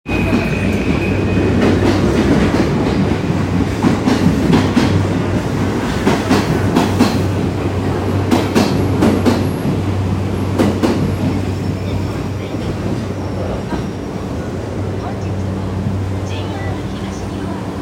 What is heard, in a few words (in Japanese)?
JR横須賀線逗子南, train sounds